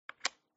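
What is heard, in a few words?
checker place, backgammon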